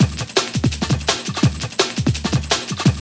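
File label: drum loop; X-Static Goldmine 3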